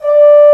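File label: Trombone mf D4; Digital Sound Factory Virtuoso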